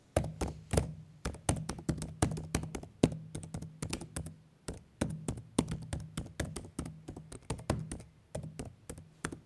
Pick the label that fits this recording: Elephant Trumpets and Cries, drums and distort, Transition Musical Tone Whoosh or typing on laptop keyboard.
typing on laptop keyboard